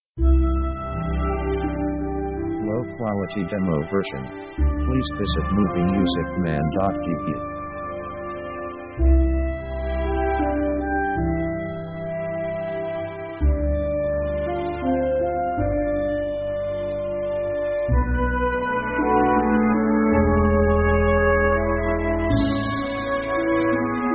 orchestra french walz; orchestra themes

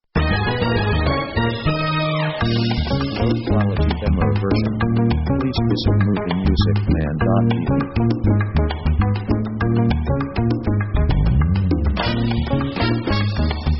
100 BPM Salsa; Latin; Latin themes

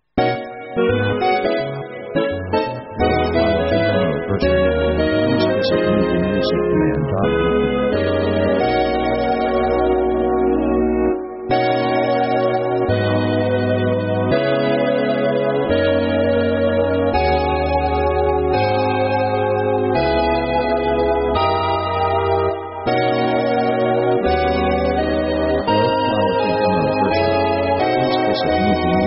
jazz

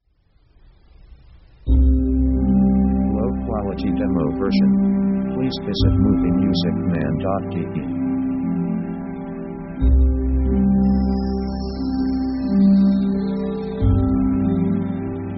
Silent Night Orchestra, slow

